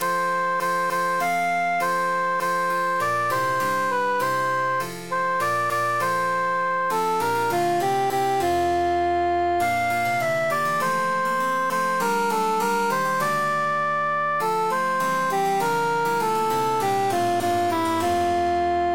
cantus emphasized; fine knacks

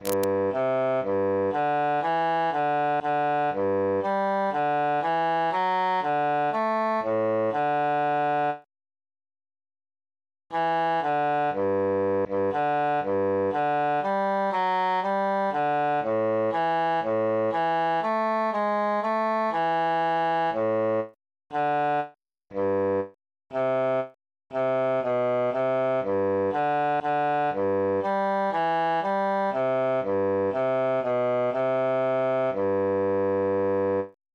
bassus solo

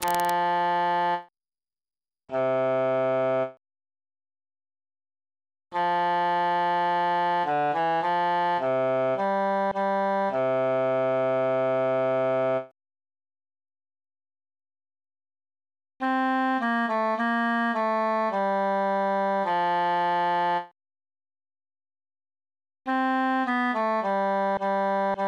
bassus solo; sister awake

